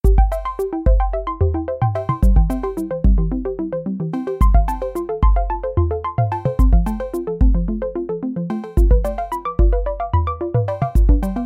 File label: menu; simplechess